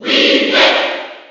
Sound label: Cheer; Wii Fit Trainer; SSB4